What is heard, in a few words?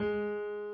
key